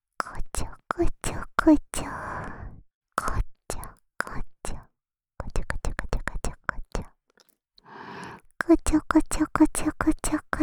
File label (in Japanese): こちょこちょ, ASMR, 左耳